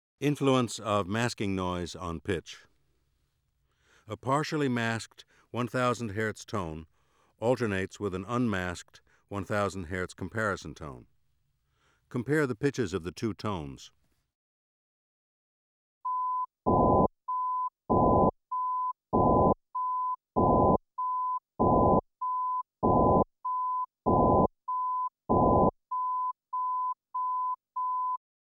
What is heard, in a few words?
Influence Of Masking Noise On Pitch; ASA auditory demonstrations